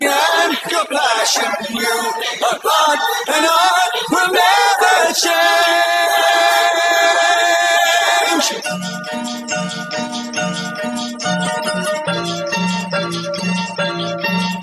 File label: vocal